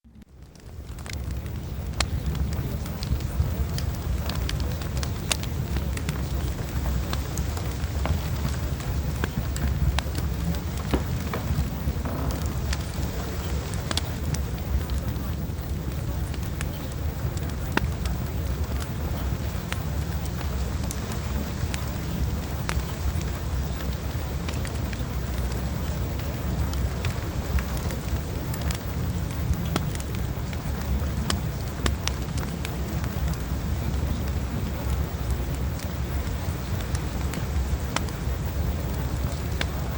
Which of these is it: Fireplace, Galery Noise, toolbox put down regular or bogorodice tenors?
Fireplace